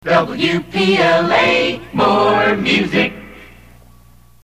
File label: jingle more music; WPLA